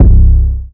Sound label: Short Thump 808 - C; Drum Samples; Cymatics; PHARAOH